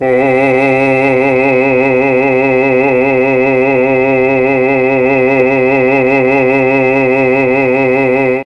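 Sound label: C2 chamber male, mellotron; CHMB MALE VC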